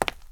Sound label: FOOTSTEP CONCRETE